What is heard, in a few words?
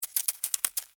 Spider Daddy Long, Animal, Spider, Daddy, Long, Legs, Walk, Dirt, Short, Arachnid, Insect, Bug; BlastWave